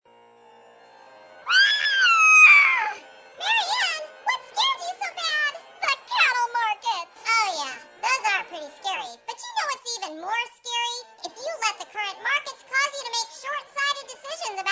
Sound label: chipmunk